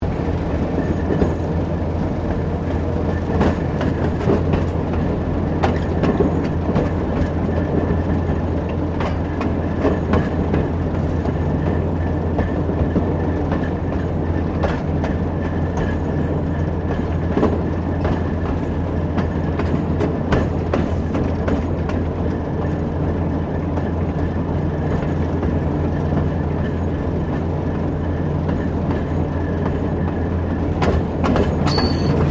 Train Diesel TGR X Class 1951 Tasmanian Exterior Operating Loop